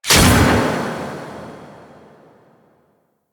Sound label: Melee Combo Mode Activate; Weapons